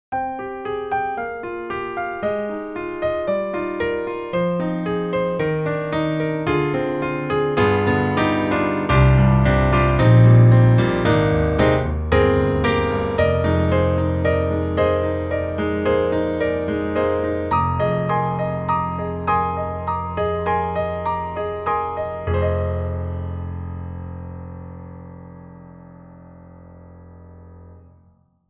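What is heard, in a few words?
piano; Native Instruments; THE GRANDEUR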